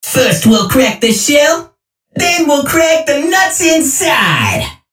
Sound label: Rumble Hit Confirmation; World Of Warships; Entertainment